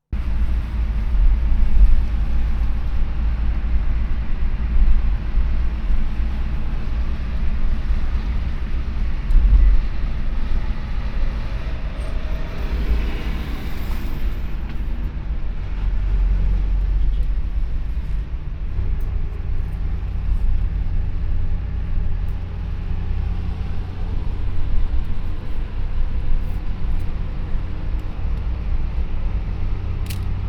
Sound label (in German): Foto-Spaziergang, Brasilien; Fieldrecordings